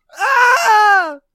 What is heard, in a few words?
death scream; blindside